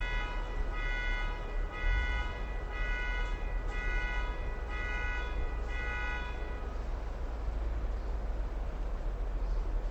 Vehicle Alarm

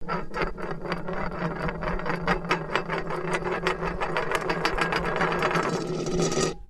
Hubcap Falls Off Car

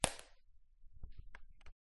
Killing A Fly With Flyswatter

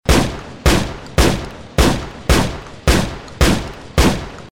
normal shotgun

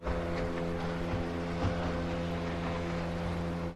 Boat

